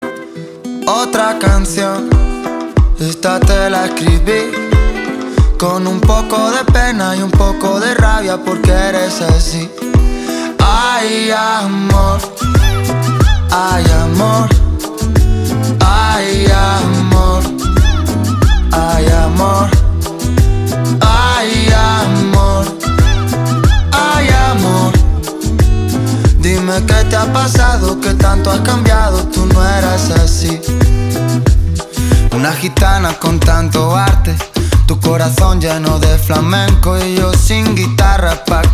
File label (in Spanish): UNA CUMBIA TRISTE